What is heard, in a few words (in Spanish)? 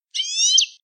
quejas simples